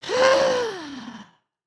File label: indignant, emotes